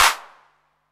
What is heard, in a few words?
808 CLAP, TR-808